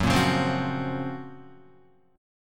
F sharp Major Sharp 11th F sharp, Guitar; F#M#11 strummed; 1,1,1,5,5,2; 4F,4A,5C,5G,5C,6E; chord shape

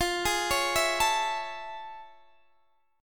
A Major 7th F; AM7/F arpeggiated

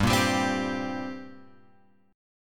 G Minor Double Flat 5th, G; Gmbb5 Chord {3 1 X 0 1 3} strummed

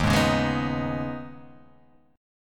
D sharp 7th Suspended 4th Sharp 5th

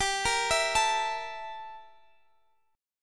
G Minor 6th Add 9th G down Guitar Half Step Down; Gm6add9

